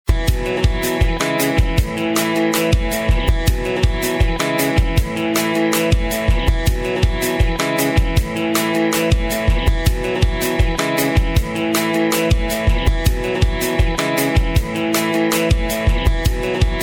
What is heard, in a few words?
bass drum; percussion